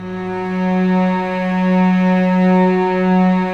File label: STR, Vcs mf Amb